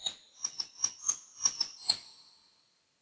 Glockenspiel

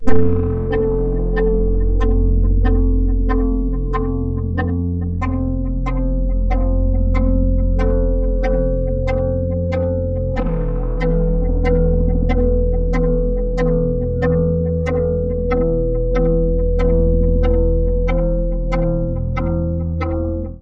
Synth Poly

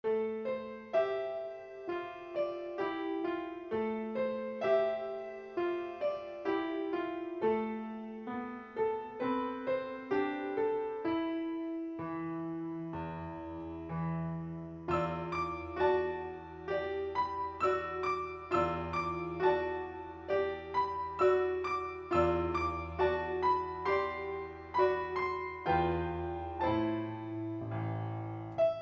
Sync Wavetable Soni

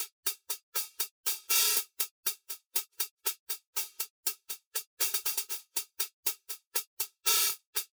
Wave Drum SEP HH

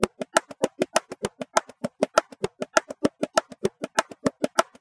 Bongo

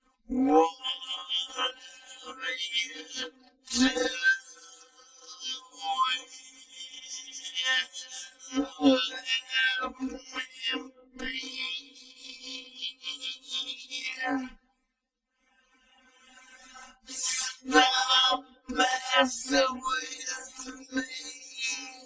Singing Voice

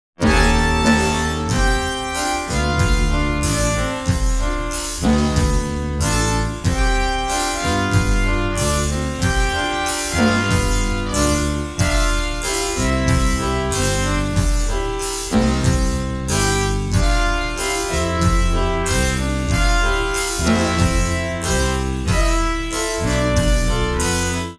Jazz

